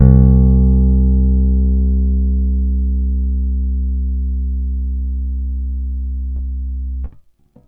Bass 3, a tone from my fender p-bass

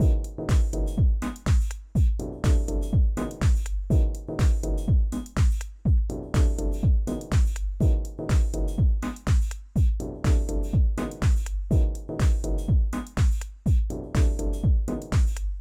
UH 7000 loop; Overbridge

